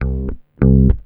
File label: bass, roots funk; USB Soundscan vol.41 - Roots Funk; AKAI